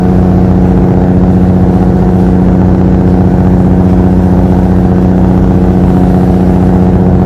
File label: motor mb axor